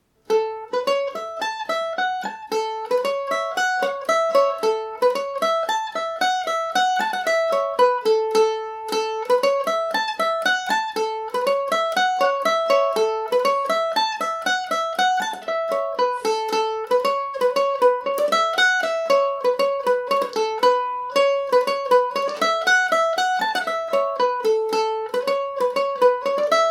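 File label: A major, irish mandolin; rambling sailor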